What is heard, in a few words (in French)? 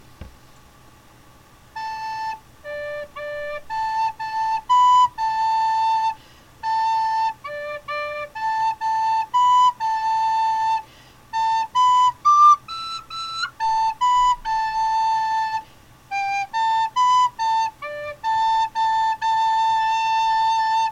Air à la flute